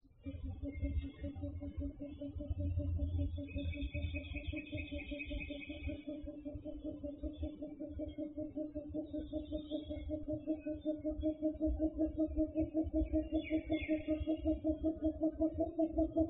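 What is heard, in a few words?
Short-eared Owl advertisement grunting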